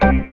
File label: ORG, DANCE ORGAN